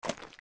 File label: steps; Jabu Jabu; zelda; OOT